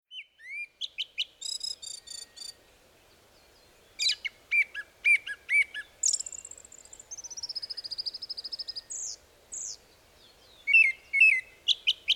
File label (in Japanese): kurotsugumi; tsukuba